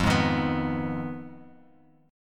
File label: F Minor 7th Flat 5th F down Bass Standard; Fm7b5 strummed; JGuitar